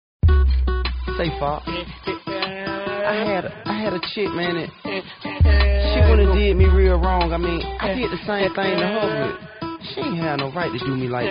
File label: funk; multitrax